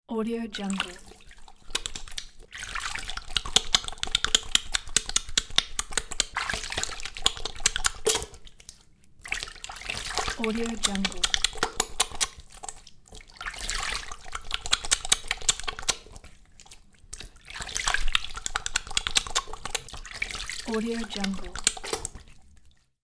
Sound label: hands washing